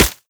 foliage, bullet impacts; physics; Half Life Model Pack

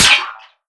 npc charger shield, bullet impacts; Half Life Model Pack

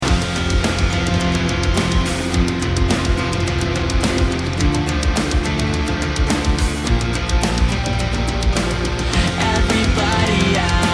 bomb planted, music

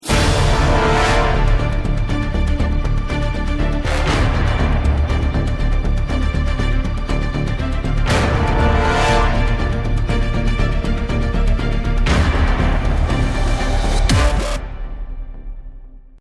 start action

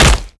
step close, zombie blind

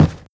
step run, footsteps cardboard

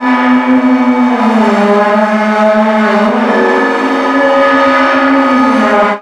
wall move, machines; Half Life Model Pack; Half Life 2

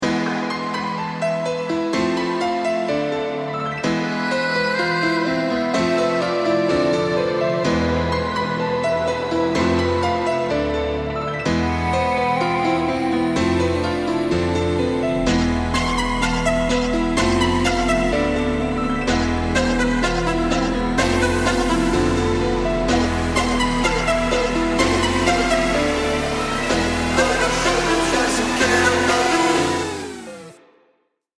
bomb planted

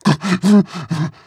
breath long, zombie

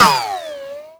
ricochet, bullet impacts; Half Life Model Pack

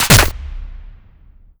bolt huge blast

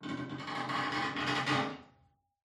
ambient wood creaks

